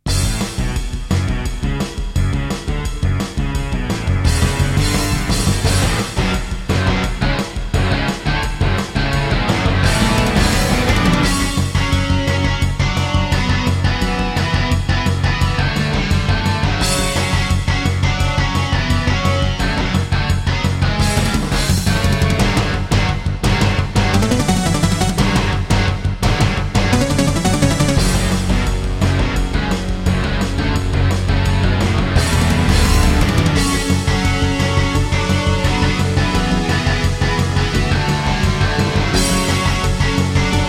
Intro, Terratec Wave XTable; Duke Nukem 3D

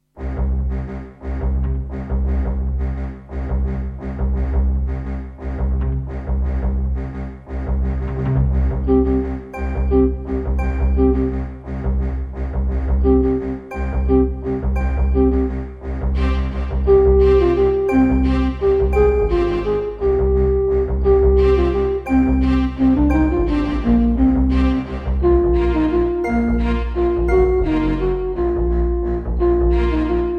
EMU SoundEngine

